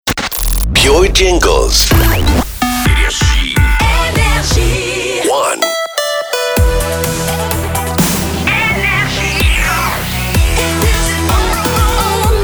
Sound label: Jingle Package; PURE Jingles; NRJ